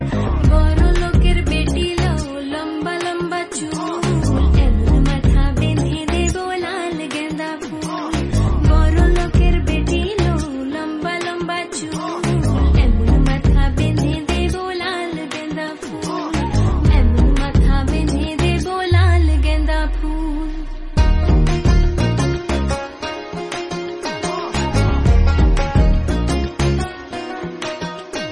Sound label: Bengali Ringtone